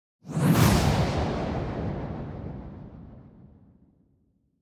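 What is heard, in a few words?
magical energy collides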